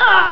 eeuh; Centaurian

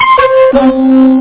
Panflute

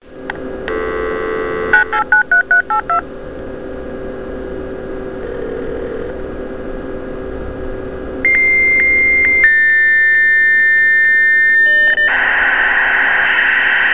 MODEM NOISE

